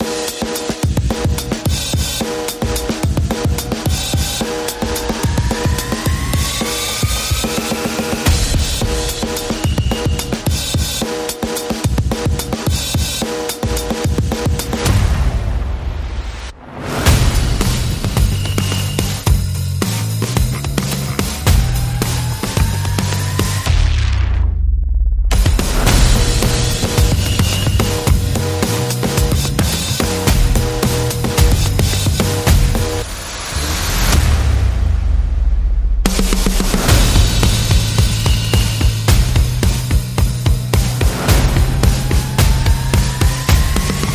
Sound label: Percussion Bass and Effects